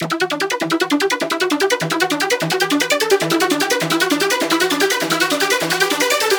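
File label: synth loop trance feels 150 E minor; Virtual Riot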